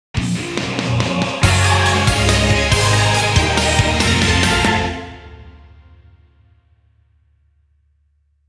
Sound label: Reward music